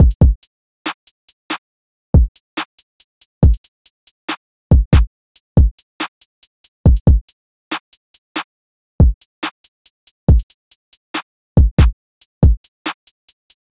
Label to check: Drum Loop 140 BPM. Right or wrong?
Right